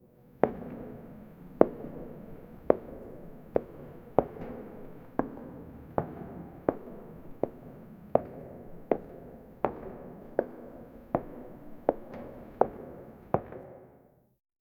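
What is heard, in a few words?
grand persian palace ambience; architecture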